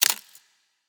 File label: symbol frame appear; Butterfly Lovers; wazdan